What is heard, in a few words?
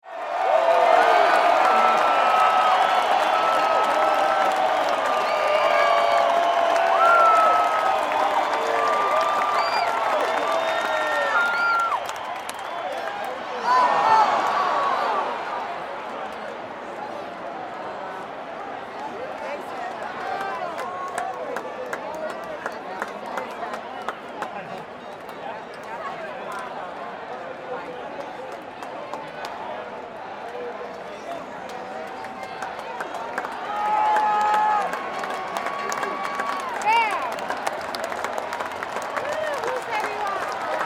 Ambience Baseball Crowd Game Walla Cheers Boos Sports